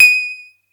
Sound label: atari 520st Ds7